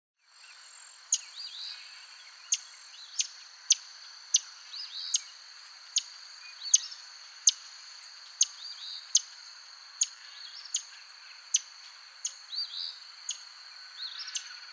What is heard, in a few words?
Palm Warbler, Setophaga palmarum